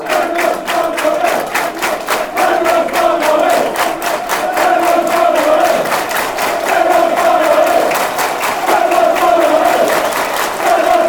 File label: brendan rodgers barmy army fast, fanchants